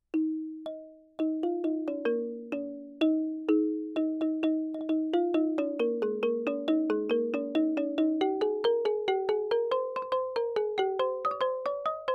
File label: roneat aik only